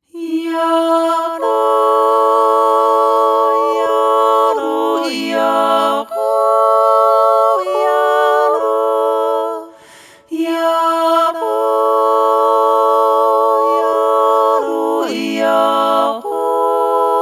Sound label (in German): jodler; musa; langau 2025